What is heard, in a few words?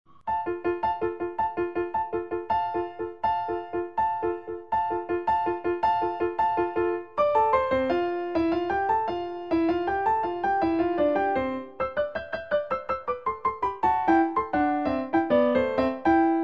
Adagio practice, Cello Sonata; Barber; Op.6